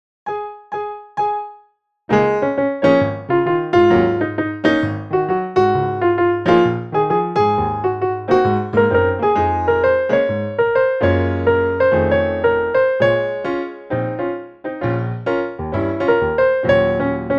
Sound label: Plunk Track